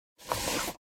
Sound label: wooden sliding door